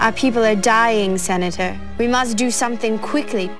dying

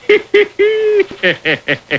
hee hee ha

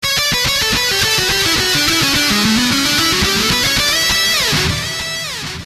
No Trem

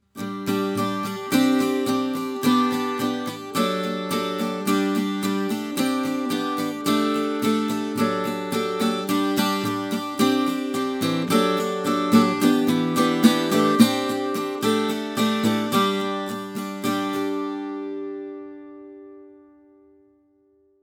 chords; sojourner

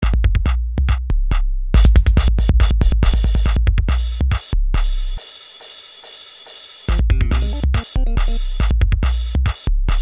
Elevator Music

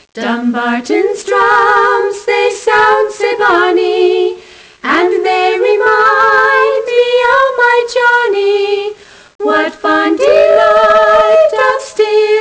drums

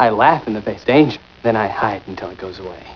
laugh danger; "I laugh in the face of danger. Then I hide until it goes away."

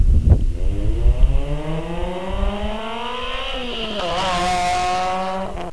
Silenced outside drive by; Exhaust

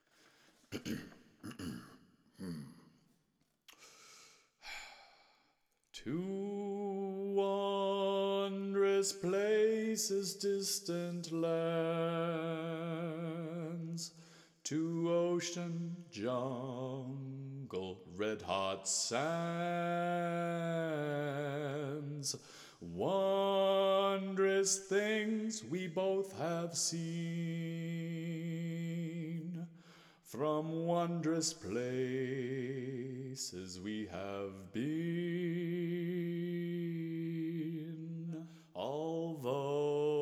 Wonderous Vocals

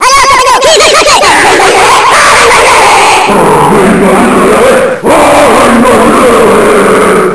chant

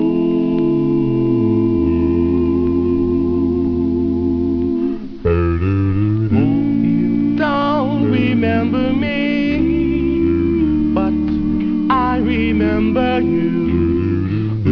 acapella; vinyl

